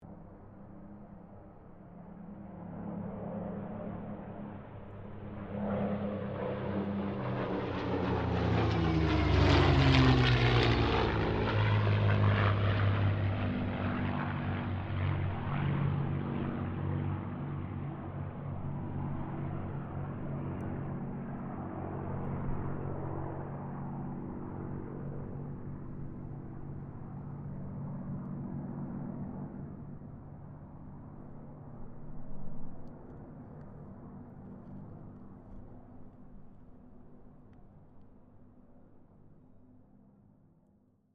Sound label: AIRCRAFT SPITFIRE MUSTANG KITTYHAWK PASS DISTANT; Mixed aircraft squadrons; Airplanes (Sound Effects:Vehicles)